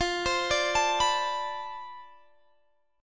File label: D-Minor 13th, F